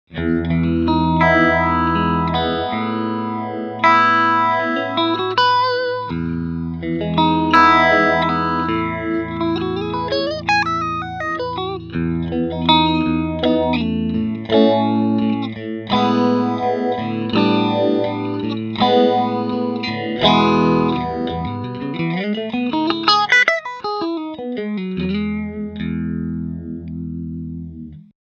clean chorus

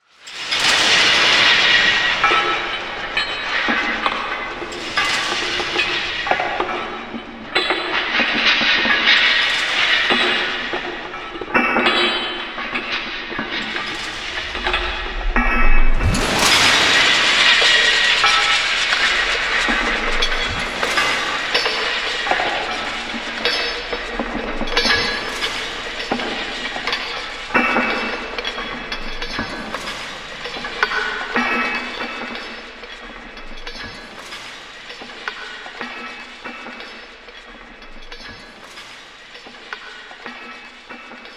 Metal Sea custom samples; Sound Particles SkyDust 3D

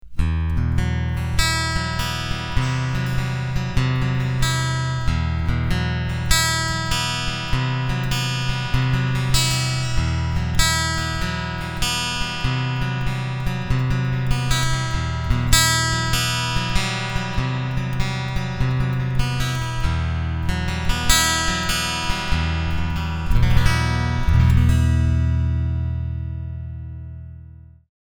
Picking MID Contour MID OFF

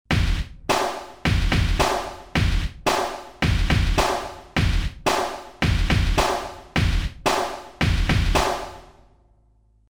Mooer Synth Drum Patch Industry Kick Snare

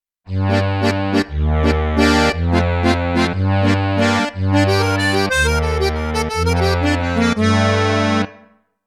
Roland Akk

